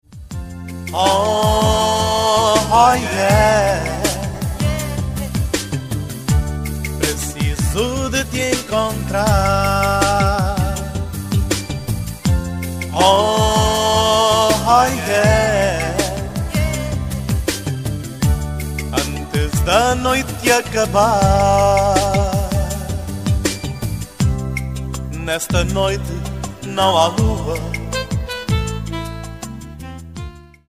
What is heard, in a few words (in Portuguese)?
A procura da saudade Pop